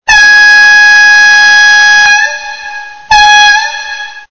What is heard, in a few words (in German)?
zug pfeife